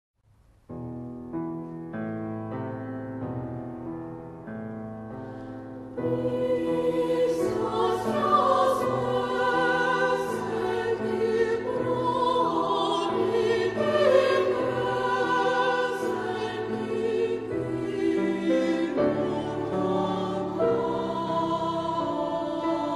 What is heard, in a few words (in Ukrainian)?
Kolyskova; Jakiv stepovyi